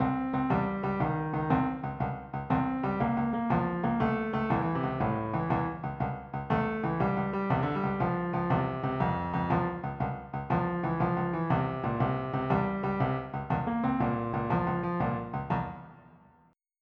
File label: Reggae